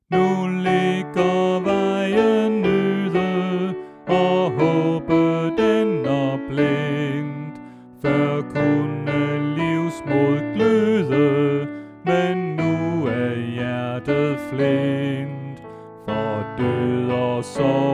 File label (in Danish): Sopran; Påske; oevefiler